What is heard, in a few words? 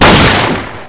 sg552; cstrike; ZP CRISTIAN; ZP WEAPONS